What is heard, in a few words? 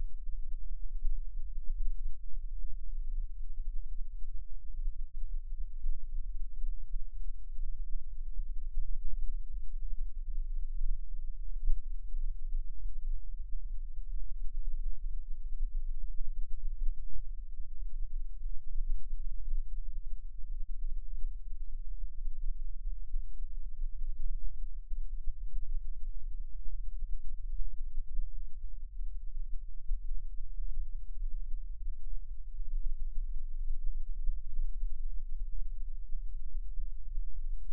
alpha, EEG